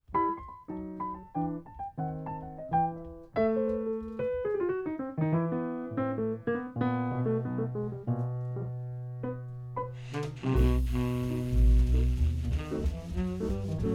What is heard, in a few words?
DANUBE WALTZ